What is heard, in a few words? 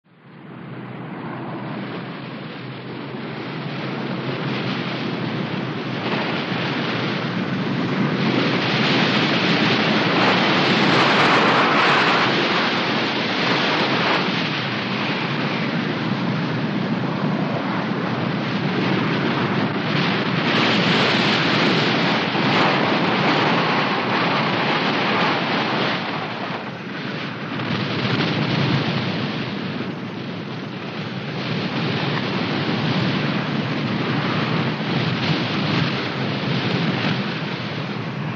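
hurricane wind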